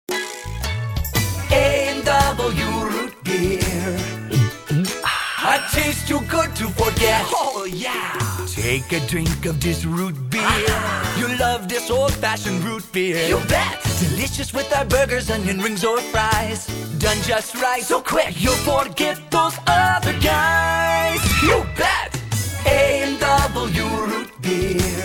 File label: Rootbeer Full Sing, drink jingles